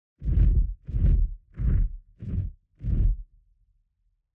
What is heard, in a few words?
flying creature